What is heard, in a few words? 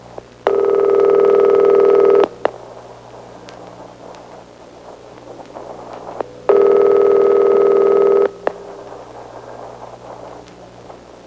ringing; Sound Library 2000; Wayzata Technology